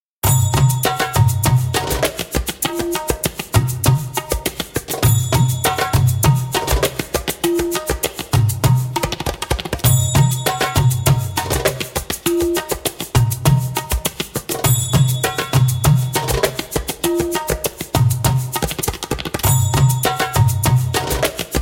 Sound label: Indian Saidi (fast) spoken, Djembolale, Grooves and Moves